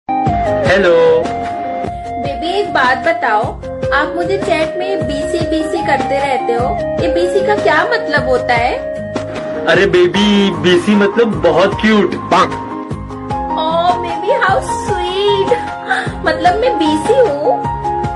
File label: Baby Attitude Sound